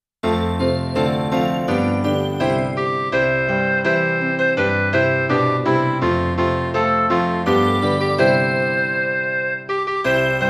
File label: boars head carol midi; The Boar's Head Carol